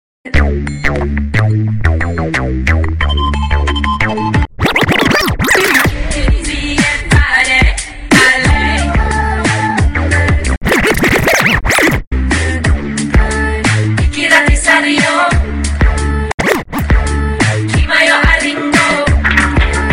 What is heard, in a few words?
Street remix; Airtel